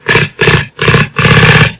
Jackhammer

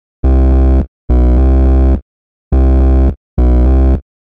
Hard Edgey Slo Bass

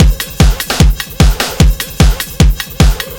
Industrial Rock Loop

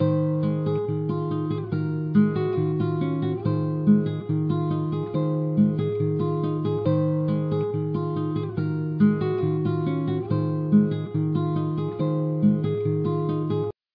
Nylon Guitar Loop

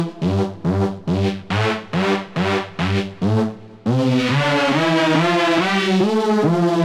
Hoover Synth

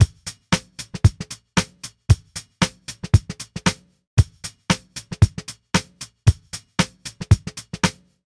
Jazzy NY Beat 115bpm

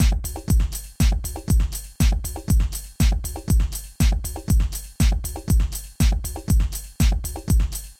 Ohio House Loop

